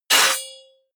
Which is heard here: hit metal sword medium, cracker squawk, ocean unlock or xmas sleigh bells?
hit metal sword medium